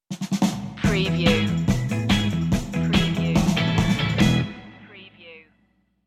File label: vintage upbeat stinger